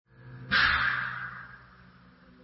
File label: Air Suspension; Teslatap; S85 2015